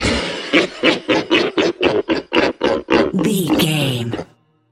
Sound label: troll monster laugh high